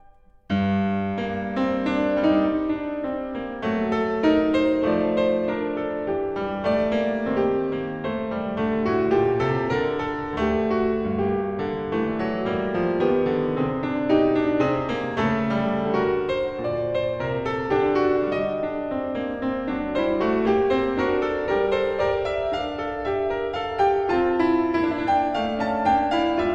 Bach Goldberg Variations BWV988 Variation 21 - Canone Alla Settima (Andante Con Moto)